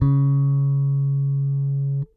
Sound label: Whole Notes C#, Bass; Bass X